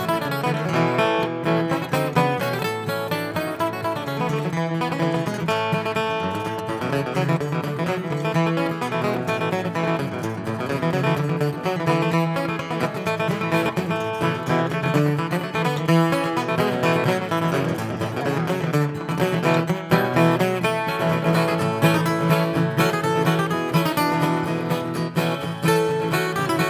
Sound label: Fiddle Tune Medley